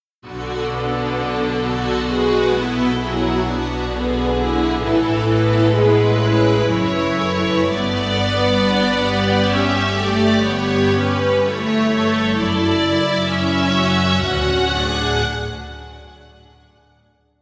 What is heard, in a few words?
bass